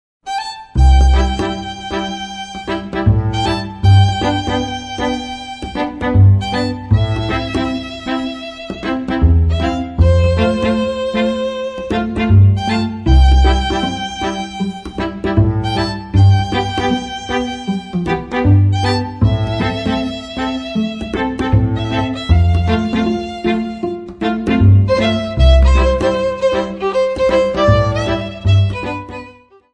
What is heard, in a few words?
String Quartet